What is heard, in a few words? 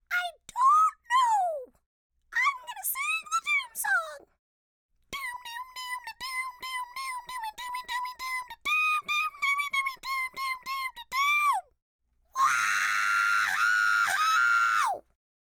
grrr